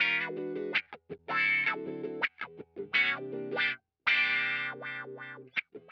Electric Guitar, Loops; Guitars

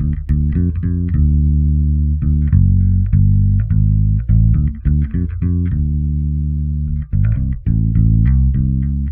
Electric Bass; Basses

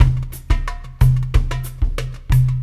Acoustic Percussion

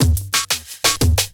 Old School Break, Drums

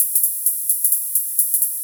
Hi-Hat Loop, Drums, Dance Beats

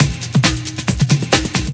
loop; SoundFactory; SMPMANIA

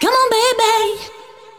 vocals; SoundFactory; SMPMANIA

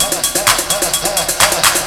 LOOP; SoundFactory; DRUMLOOP

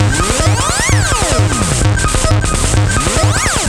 Electronica Drumloop; Drums

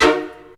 POOR HIT, STAB; SoundFactory; SMPMANIA

